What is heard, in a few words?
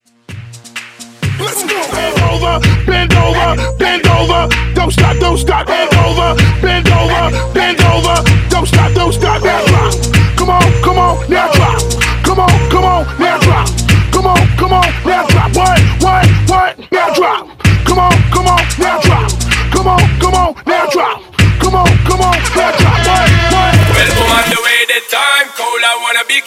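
Hype Intro Acapella Out Instrumental 128 BPM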